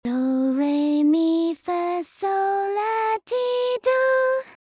solfa in C

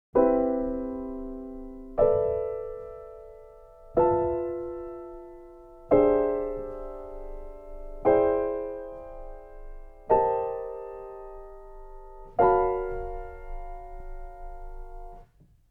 more tones harmony

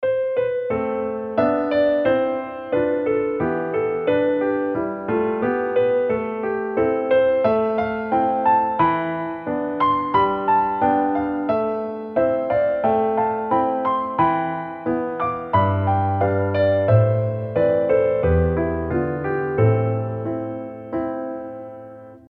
minor piano natural

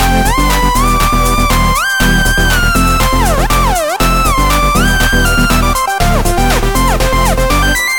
shell change edm

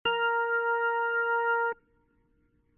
organ

